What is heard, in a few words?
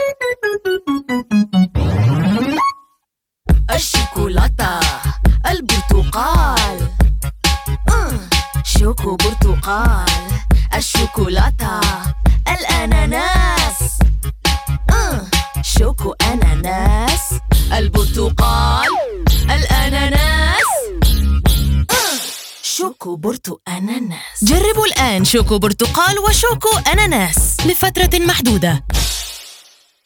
Jingle